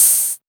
Ride, Cymbals; Vengeance Samples; VEH2

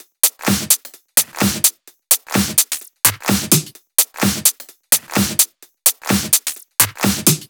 128BPM Drums; Vapour; Vengeance Samples; Vapour Kit

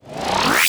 FX Scratching; Vengeance Samples

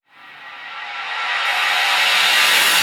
reverse, FX; Vengeance Samples

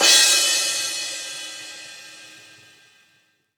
Crash, Cymbals; Vengeance Samples

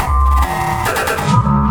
Fill 140 BPM

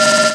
Synths D#; Vengeance Samples

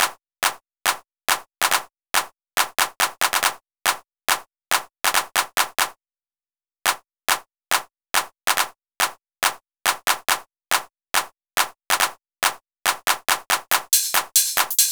Drum Mix, 140 BPM; Vengeance Samples; Song Kits; Rap; U Got Me Rocking

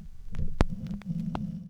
Record End, Vinyl Record; Record Noises

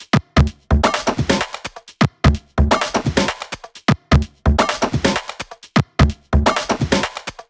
128BPM Tribal Kiss Kit

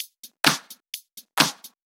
Electro Loop 128 BPM; Vengeance Samples

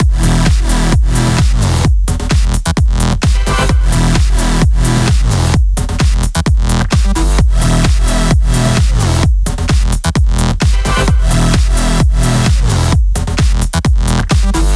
128BPM Mix; Vengeance Samples; Machine Kit

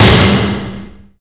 FX Impact; Vengeance Samples

